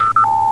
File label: shields down